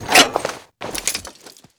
RPG reload twist, foley; Call of Duty Modern Warfare

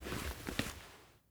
bone club deploy; Rust

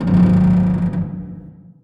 Amb Constructions; Red Orchestra Ostfront 41-45

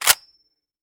fal slider; Escape from Tarkov

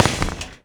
grenade catwalk

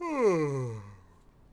Yawn Vox; Civ4 warlords

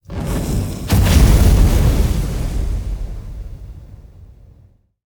damage vehicle; World Of Warships

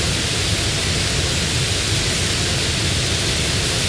57mm rocket trail close; Modern Warfare

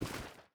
footstep rubber boots stones walk; Rust

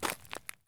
run concrete; Escape from Tarkov

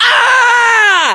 melee attack; english; voiceovers

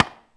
Hit Spike; Civ4 warlords

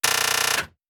gun reload; World of Tanks

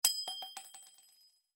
shells 20mm bolt

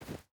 footstep barefoot snow run; Rust

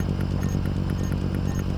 relay stand by loop

